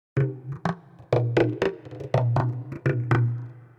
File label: PERC LOOP ETHNO 125; eJay